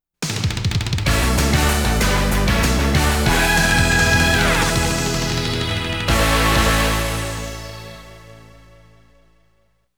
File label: WIN, Goal BGM